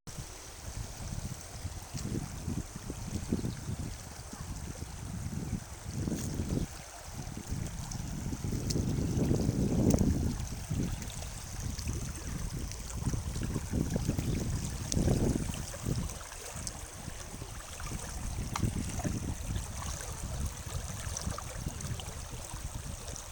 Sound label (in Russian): Река; Около Греми